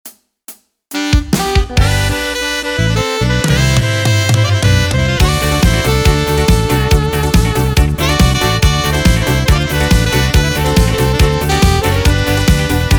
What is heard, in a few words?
Style Yamaha; yamaha psr sx600